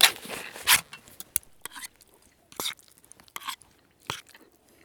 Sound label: tin can use; Escape from Tarkov